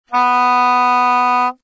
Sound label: C sharp 4, soprano saxophone